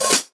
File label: subtle open hat one shot c sharp key, Royality free open high-hat sample tuned to the C# note.